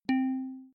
harp Pickup; balloons-and-static-electricity

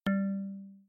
harp Drop; balloons-and-static-electricity

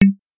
boundary Upper

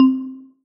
Bright Marimba; phet io wrapper sonification

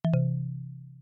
particle Drop; build-an-atom

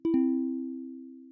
balloon Drop B; balloons-and-static-electricity

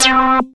Emission; molecules-and-light

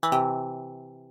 balloon Drop C; balloons-and-static-electricity

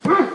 dog bark; phet-io-wrapper-sonification; build-an-atom

